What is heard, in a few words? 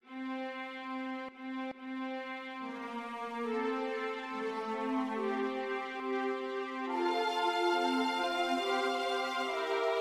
Bluesy Heptatonic; Converted to Bluesy Heptatonic.; Gallery of music generated by program Largo.; converted to another modality; Modulations